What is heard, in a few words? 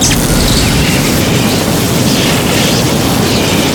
tear gas sprayer loop crit, weapons; tf2classic